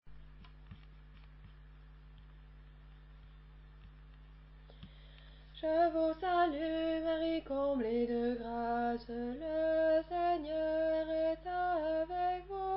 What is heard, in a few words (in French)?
Voix d'alto; je vous salue Marie